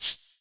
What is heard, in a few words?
Roland SC-88 Cabasa